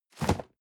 cardboard box medium place slide hard; objekte

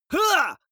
male fight hit shout; comedy-cartoon; soundpool

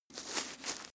step grass turn

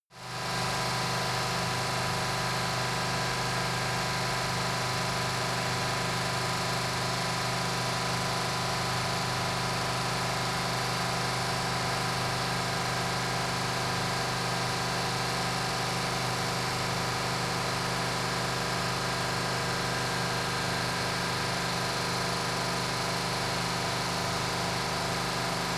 car camaro high rpm engine

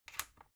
magnetic plug soft, elektronik; objekte

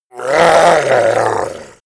yeti growl painful; kreaturen

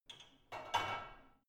barbell only replace soft; sport freizeit; fitnessstudio

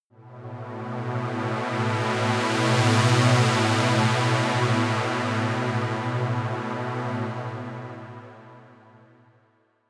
drone heavy saw bass swell long; musikelemente; drones

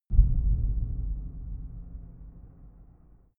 far outer space shadow, hits impacts

